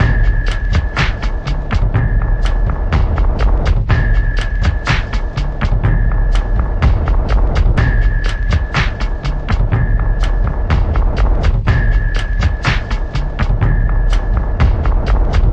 062 bpm sonar beat

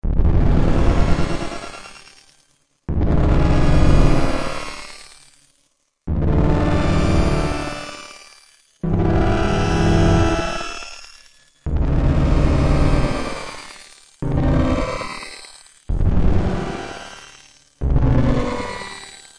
carbon hungry distorted blasts; Atmospherics

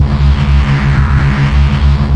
bass

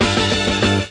brass funk punches; Horns and Brass

